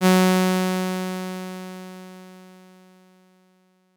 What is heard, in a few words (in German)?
Saite g Oktav 415Hz, Leise Lauten; Stimmton: g